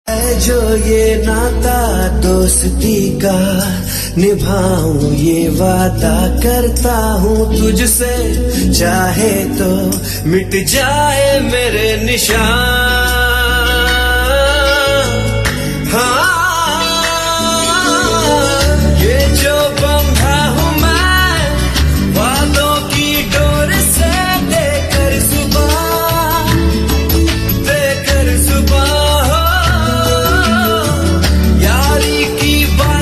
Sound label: Male Title Tune; Zee TV; Yeh Vaada Raha; TV Serial Tone